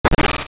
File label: Shatter; A thrown object shattering